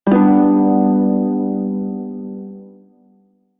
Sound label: Shawzin Maj Chord; Warframe; Prime Shawzin